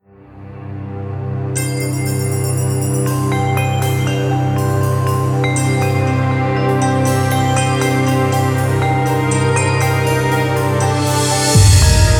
Final Music; Yeesha

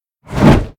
Flapping; moul; Bahro

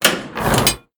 Select All Out; moul

